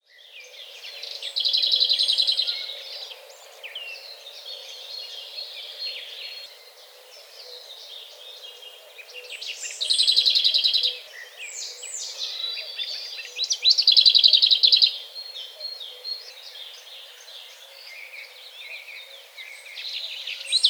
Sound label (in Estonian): v2ikepoosalind; spring